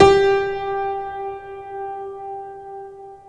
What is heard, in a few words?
G sharp 3 forte sustained; gba dev making music